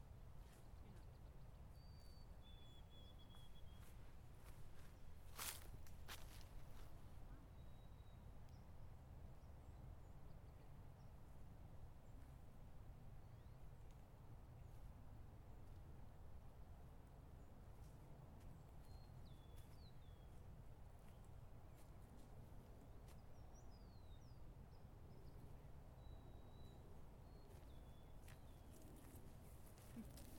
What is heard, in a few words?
Stone Mountain Bird Sanctuary, natural sounds; Bird Calls